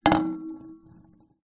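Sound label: musical kalimba down on hard surface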